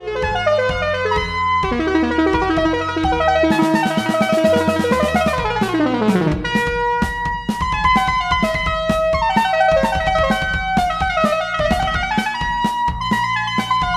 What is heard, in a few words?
lead brassy essence